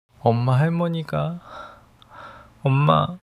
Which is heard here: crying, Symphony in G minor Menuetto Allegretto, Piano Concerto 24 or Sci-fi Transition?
crying